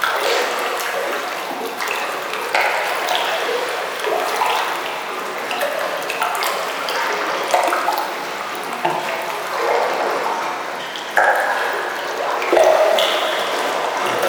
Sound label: Water Lapping Loop